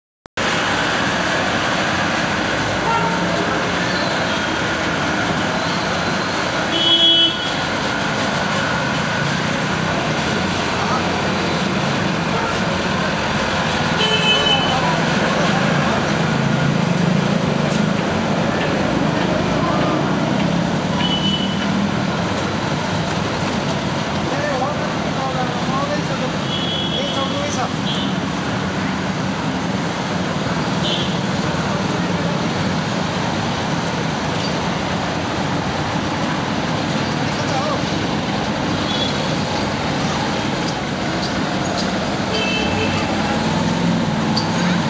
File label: Boudha Road, Kathmandu, Nepal